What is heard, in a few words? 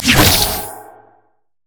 pick a card gold card; LoL; twistedfate